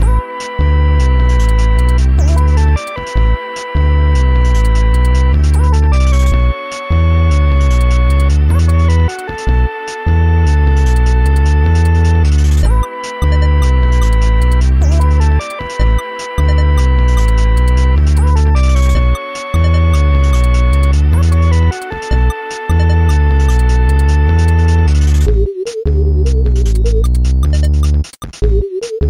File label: dnb